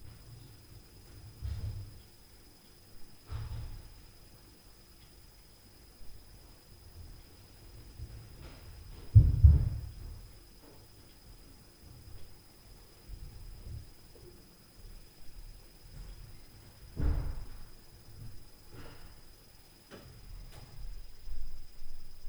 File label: Upstair Noise BOOM Smashing Door Noise, Night Noise